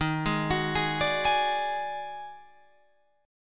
D sharp Minor Major 7th Double Flat 5th D sharp Guitar Open F; arpeggiated